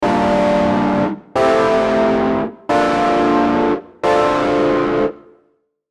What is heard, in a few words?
Distorted Chorus Chords